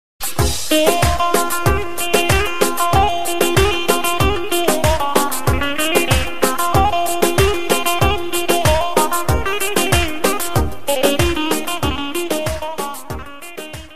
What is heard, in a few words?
instrumental arabics ringtone